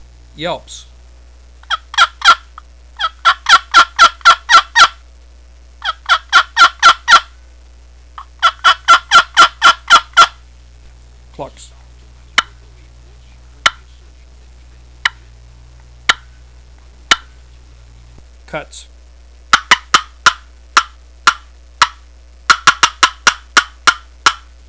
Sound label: mahogany all, turkey